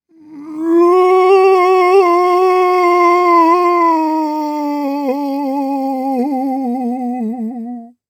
Horror Scary Ghost Moan Old Man Roar Ghost Moan

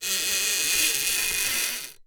Foley Metal Squeak Stress Hand

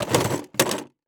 Industry Tool Staple Gun Place In Tool Box

